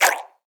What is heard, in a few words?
Sticky Slurpy Steal; Nicktoons Basketball